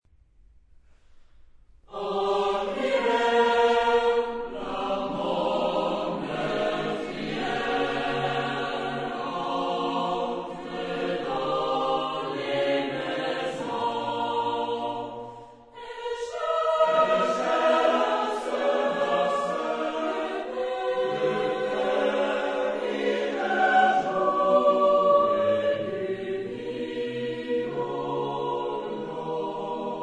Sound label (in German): Kammerchor; en hiver